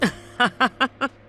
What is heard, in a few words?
Laughs; Souji